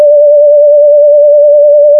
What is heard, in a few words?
D5 tremolo